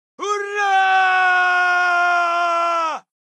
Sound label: charge, Voices Emotes, ru